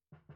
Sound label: Sub